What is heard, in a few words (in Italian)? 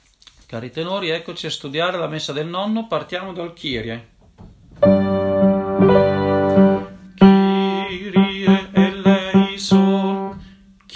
Kyrie Tenori; Santucci; Messa del Nonno